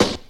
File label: thick hip hop steel snare drum E key; 90s